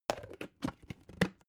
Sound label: plastic bowl close soft